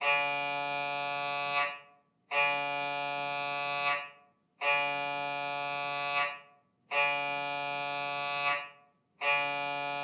siemens 3t magnetom prisma fit whole body human scanner mri t1 mprage